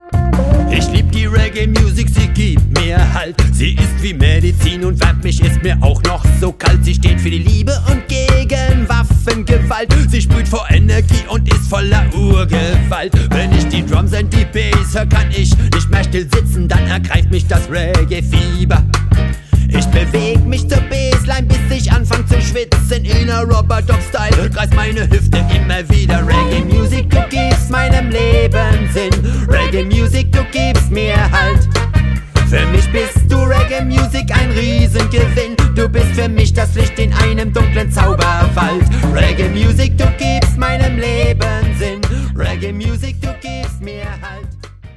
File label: reggae music